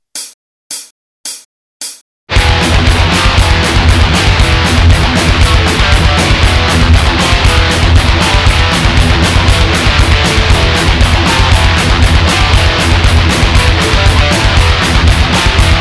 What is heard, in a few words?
metal